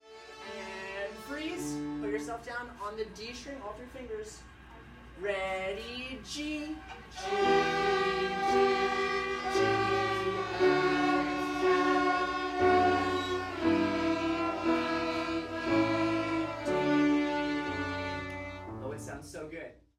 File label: Viola class